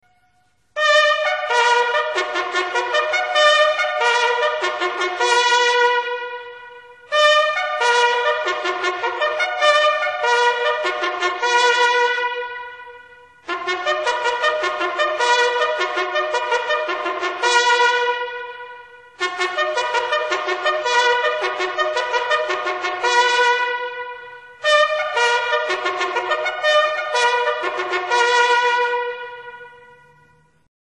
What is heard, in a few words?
Reveille, navy bugle